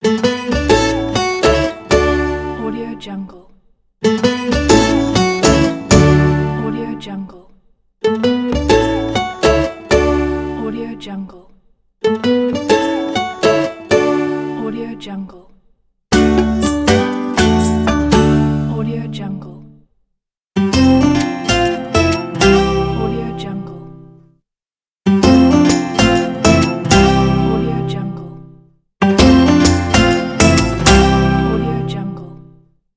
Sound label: positive acoustic logo pack; beathebeat